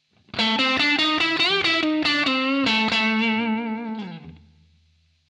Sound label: string bends